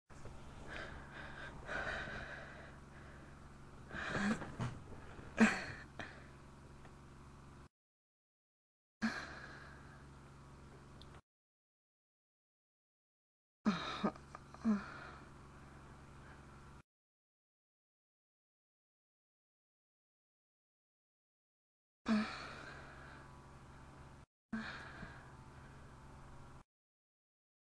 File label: Moans